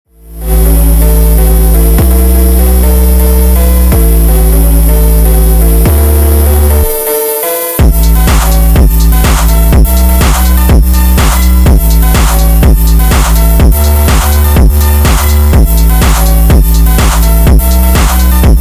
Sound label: Phonk For Gym